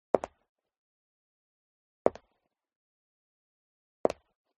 FOLEY STEP High Heels Concrete Single Right; smartsound

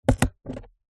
stapler pocket sized single staple cardboard; office

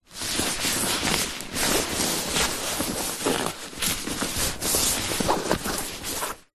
foley suitcase fabric handling

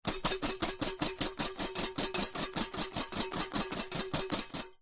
food whisk metal whisking eggs in glass bowl

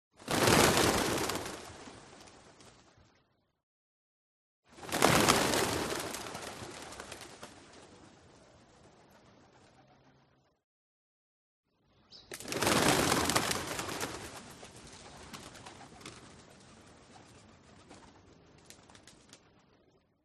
BIRD Pigeons Flock Rise Medium; ANIMAL; smartsound

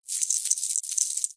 industrial chain small light movement short

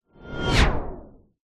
sound design whoosh harmonic into slow motion; zapsplat

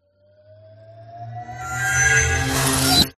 TONAL ENERGY RISERS

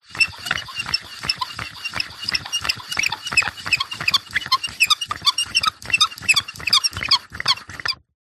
industrial plastic handle lever turn squeak; zapsplat

